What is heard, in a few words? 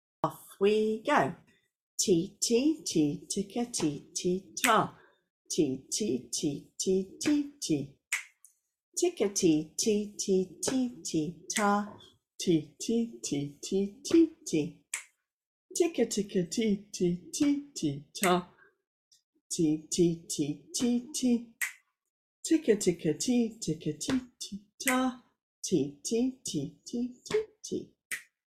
rhythm with ostinato